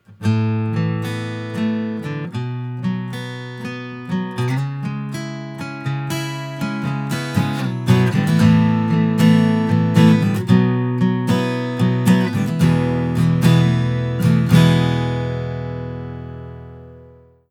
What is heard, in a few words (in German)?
gitarre natural